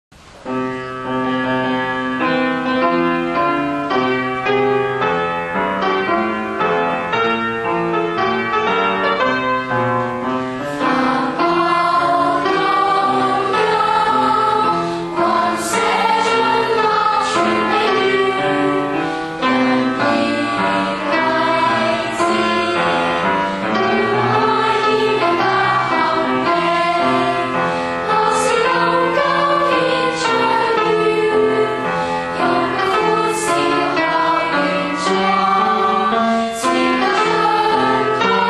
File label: school song with voice; school intro